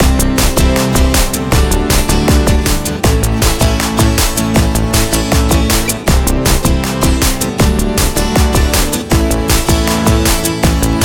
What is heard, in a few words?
groove